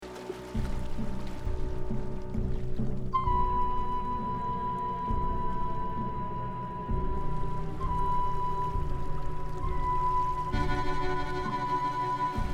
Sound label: audition minus voice; Ariel